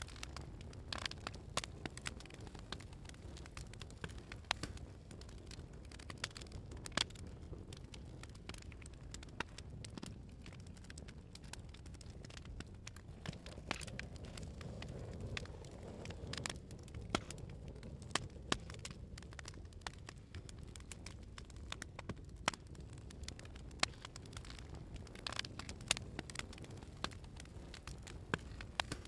ASMR Fire Sounds, The Fireplace

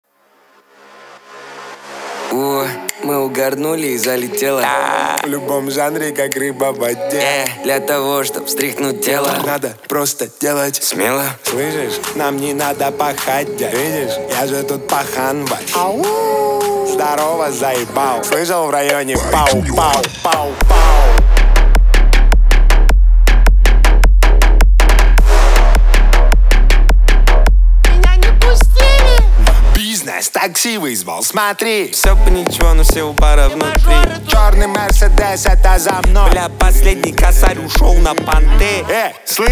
37-39-41hz, Low bass

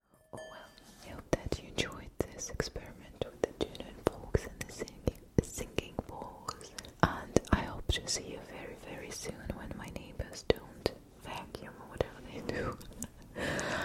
ASMR Tuning Forks

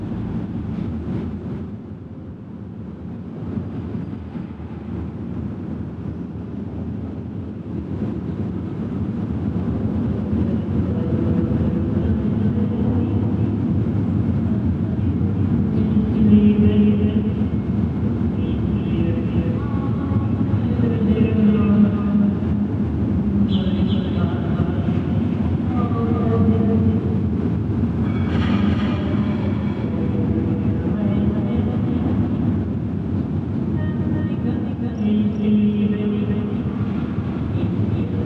Backyard Soundscape

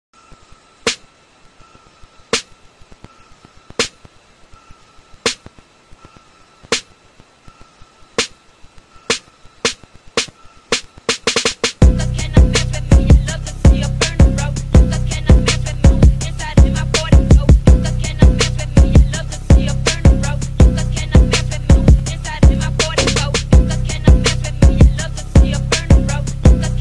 Instrumental

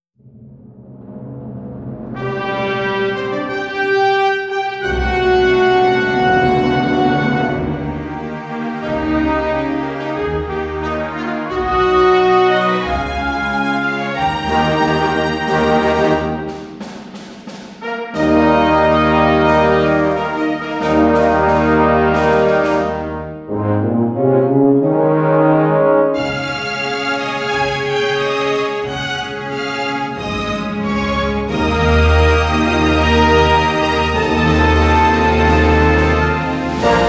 Victory Celebration Fanfare

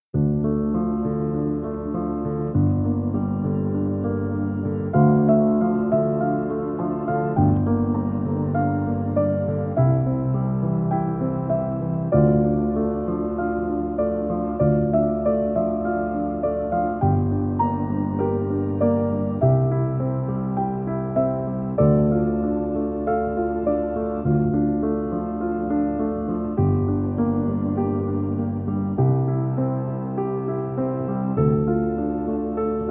Classical Piano Music

